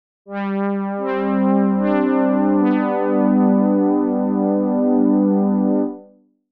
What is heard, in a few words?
synth lick